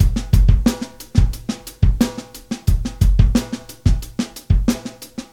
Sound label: 119 bpm 00s breakbeat sample f sharp key